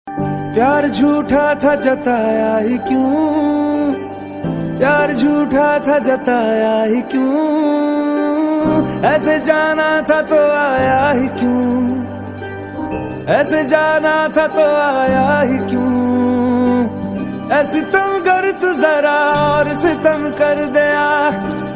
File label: hindi ringtone